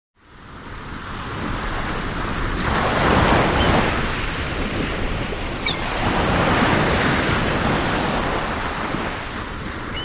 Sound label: Ocean Ambience Seagu